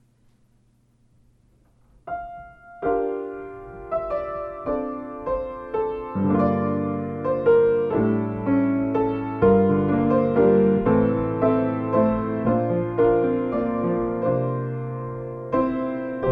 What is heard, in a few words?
Away In a Manger Piano Solo